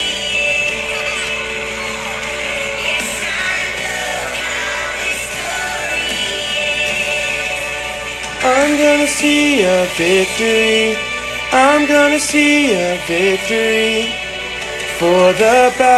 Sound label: CHORUS TENOR; worship